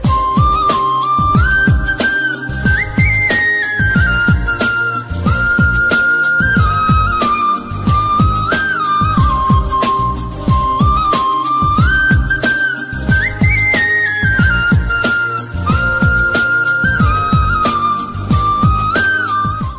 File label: punjabi english stylish ringtone